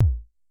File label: Drum, Vermona DRM1-MK2, Kicks